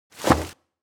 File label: mail trash